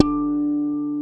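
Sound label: GUI Harmonic Flange D3, acoustic guitar; Best Service Gigapack 2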